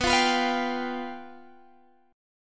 G 7th B

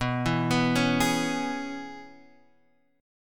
E 6th, B

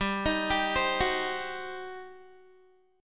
G Major 7th Suspended 4th Sharp 5th, Guitar; GM7sus4#5; arpeggiated; 15,18,17,17,18,x

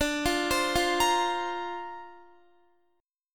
D-Minor 7th Sharp 5th D Guitar, chord; Dm7#5; arpeggiated

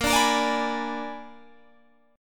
A sharp 7th Flat 9th A sharp down Guitar Open G

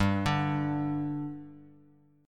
G 5th G, chord; G5 arpeggiated

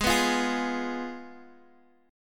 Ab Minor 6th Ab, Guitar Open G; down

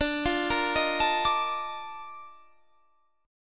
A sharp Major 11th D

